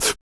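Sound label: vox percussion, Vocal Planet Jazz FX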